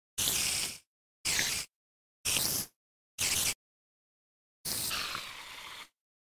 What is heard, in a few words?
minecraft spider sounds